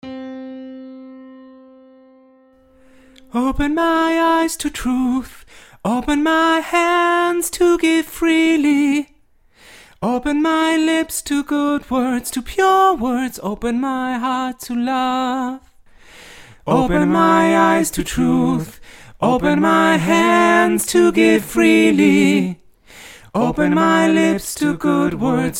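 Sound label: open all voices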